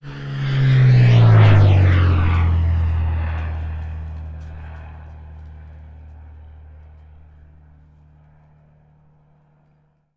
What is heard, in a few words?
Low Airplane Flyover